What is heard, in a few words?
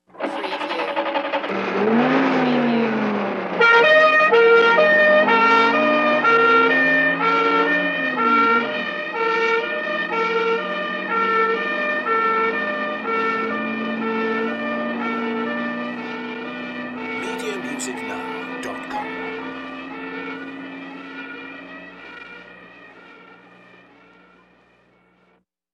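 Euro Police Start Drive Siren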